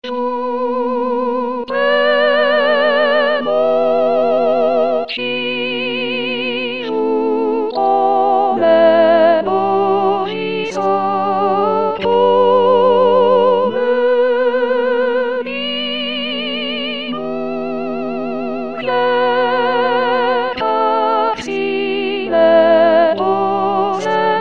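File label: soprano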